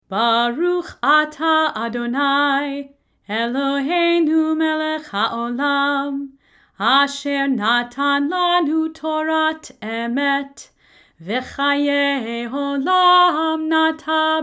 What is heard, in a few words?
Torah Blessing Closing